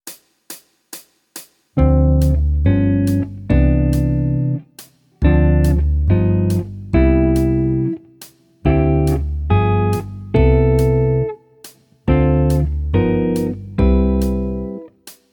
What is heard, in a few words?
Jazz, Guitar